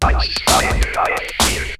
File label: LOOPS, TECHNO; Syntec; Wall of Sounds; TECHNO-BOX